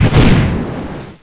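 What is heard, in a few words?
rocket launch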